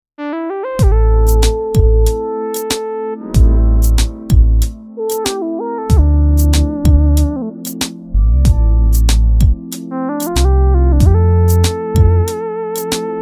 Transparent Limiting

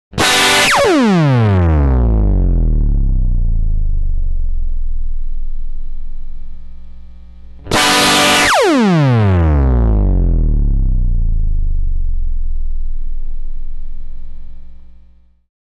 Dissolve Tube Zipper

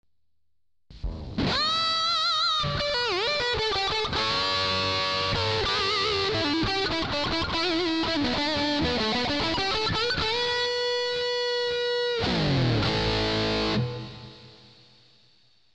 lead

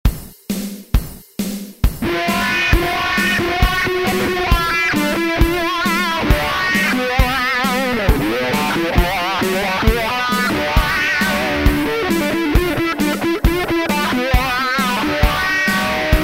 Auto wah

